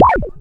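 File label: synth beep, Stabs, House; E Lab; XTc Files of House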